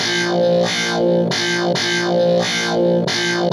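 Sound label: Rage Guitar Dry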